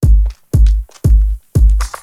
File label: breathy techno kick loop; drum loops